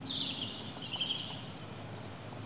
birds, ambient animal

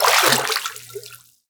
fs fm water, footsteps; Portal 2

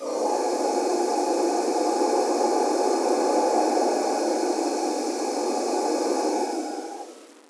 pipes, tones; ambient; Counter Strike Model Pack; CS2

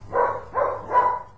dog; Counter Strike Model Pack; CS:GO

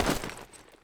sand, footsteps

